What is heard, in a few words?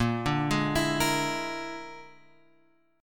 A sharp 7th Flat 5th A sharp, A# 7th Flat 5th chord; A#7b5 Chord {6 5 6 X 5 6} arpeggiated